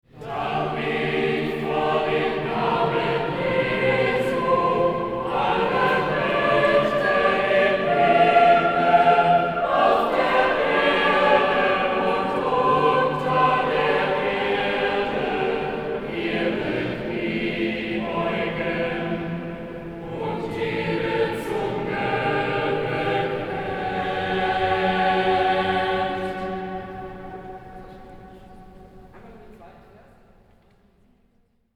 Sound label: Dom Choir Rehearsal; Munster; field